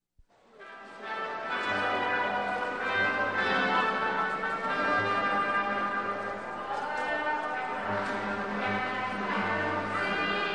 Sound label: ballgame anthem; Sound FX Real Life